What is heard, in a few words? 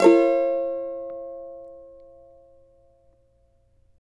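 B minor, Guitars; Spectrasonics; Hans Zimmer Guitars VOL-2; CAVA CHORDS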